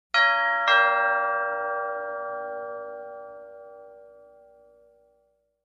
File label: Home Doorbell Rings 1x; Classic, Low-pitched, At Close Perspective